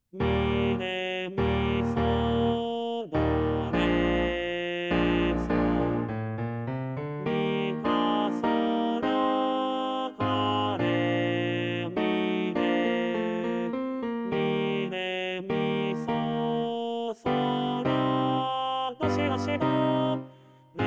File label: staccato; 階名唱